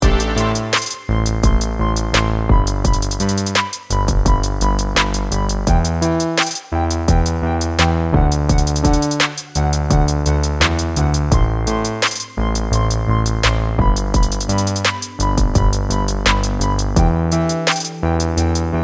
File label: shimmer lead; leaf by music sound software